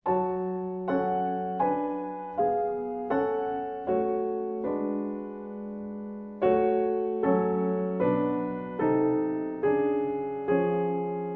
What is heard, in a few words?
Solo Piano